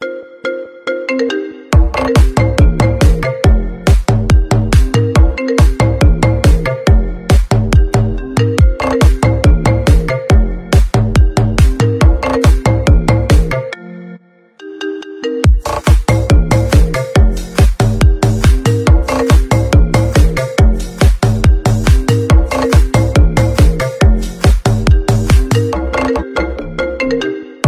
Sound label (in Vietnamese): Nhạc chuông Cuộc Gọi Đến; remix; điện thoại